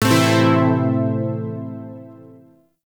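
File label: Shawzin Pentatonic Major Chord K; Zariman Shawzin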